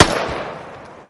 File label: Rifle; MilkChoco